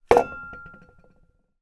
emergency fire extinguisher put down on hard floor